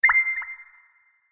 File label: htc notify zing; ringtones